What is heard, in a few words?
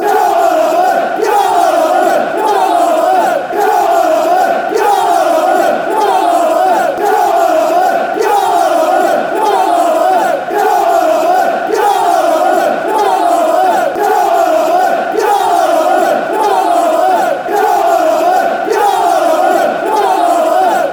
come, chants; oldham